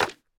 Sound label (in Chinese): 树脂砖放置声